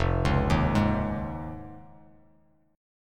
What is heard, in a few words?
F Minor 7th Sharp 5th, Bass Standard; Fm7#5 arpeggiated; 1,4,1,1